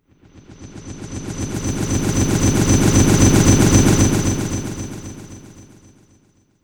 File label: Kawai K5000W Helicopter